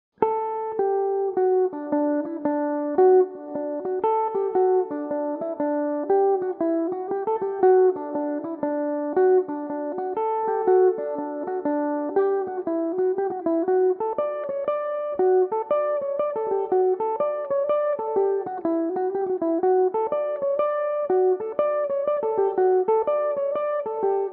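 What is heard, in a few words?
woods of fanad D major, irish mandolin; Belmuse EM-190 electric mandolin; The Woods Of Fanad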